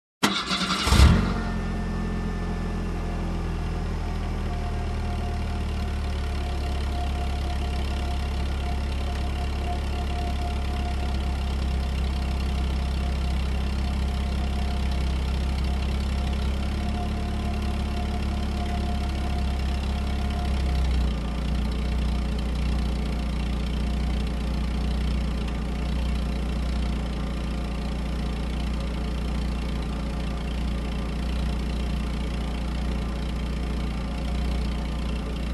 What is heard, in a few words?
Car engine running idle